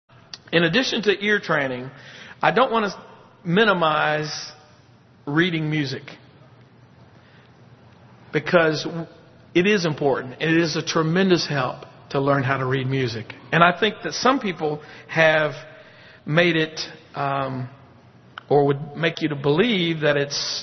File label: Shape Notes; Tulsa 2007; Lancaster